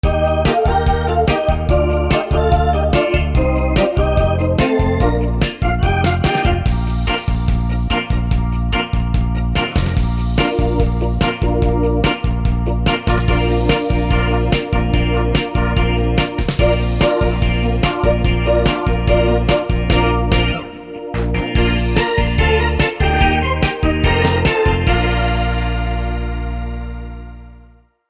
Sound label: euro pop organ